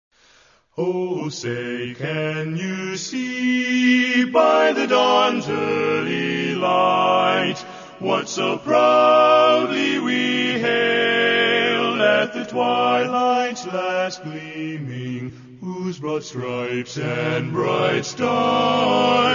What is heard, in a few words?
Star Spangled Banner, National Anthem - Barbershop Quartet; July4